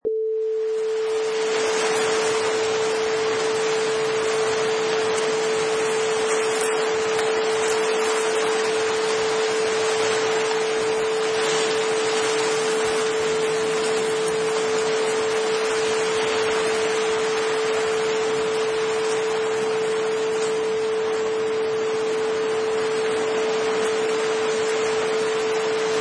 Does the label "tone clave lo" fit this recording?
No